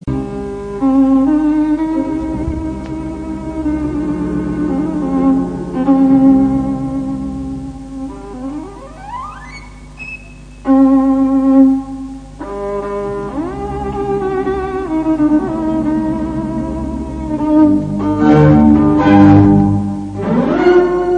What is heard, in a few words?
violin